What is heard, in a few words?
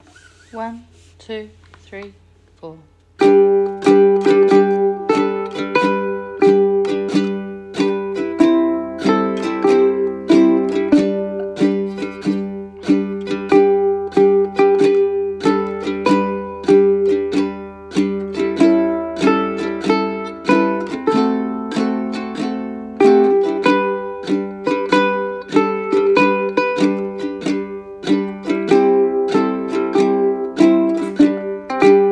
Uke Tutira Mai; Uke book